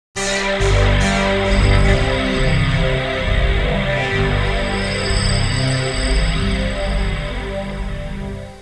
musica tecno; techno

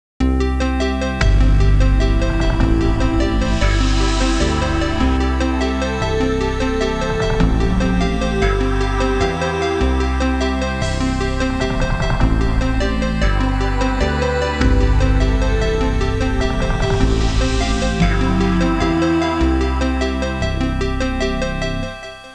musica elettronica

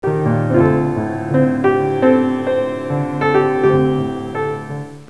suonerie classica; musica classical

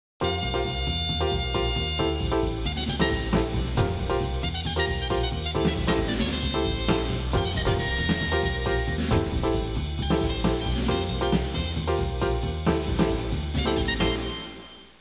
musica latina

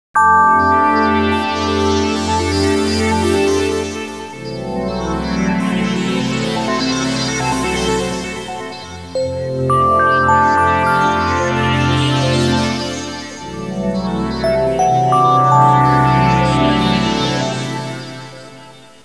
musica tecno; techno

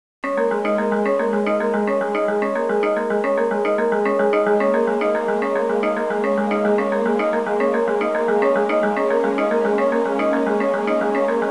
suonerie musica ritmica

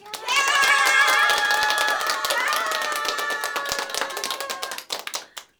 applauso, applausi, persone

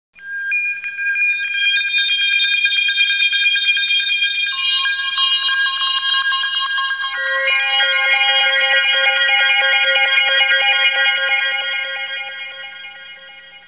musica newage

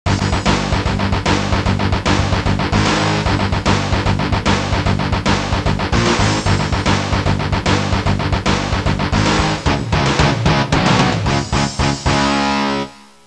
suonerie musica rock

